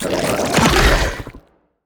shoot acid throw; crocs; abominor

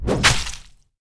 assassin sneak attack

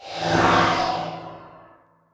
halloween vampiress drain life

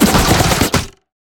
crocs hero throws spikes

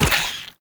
spiders enemy spider cultbrood death

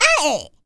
gnome death

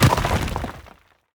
house destroy; crocs; arboreans

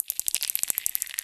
ambience spiders

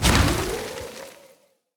fairy Dust; heroes nyru

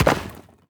house hit; crocs; arboreans

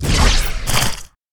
boss umbra explode

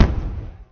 Dwarf shot

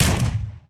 tower rocket Gunners basic Attack

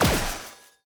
tower basic Attack; elven Stargazer

